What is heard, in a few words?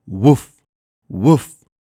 Woof